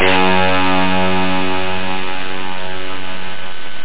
dist guitar

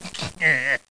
Ah Ow!; Bible Fun Pack; Education Publishing Concepts

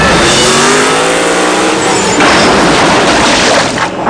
car smash

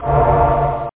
SPELL

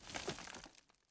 ROCK

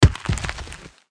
spearman fall

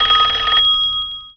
phone; BESTSHOT; noisybit

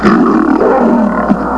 Roar; Grizzly

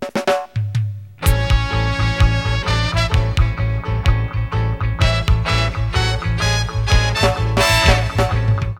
DRUM, Synthé

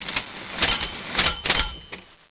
slot

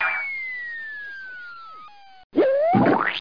Sound BUG SPLAT

